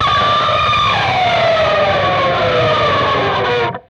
PRS SCRAP, Guitars; PRS SCRAPES

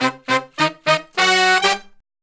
Horn Section

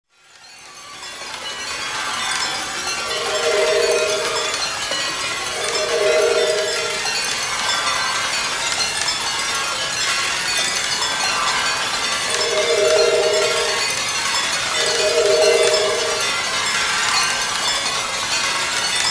Metallic Fragments, Metallscherbe; Bizarre Planet

